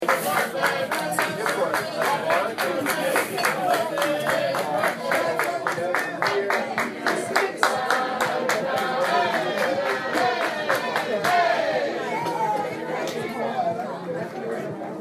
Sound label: Applebees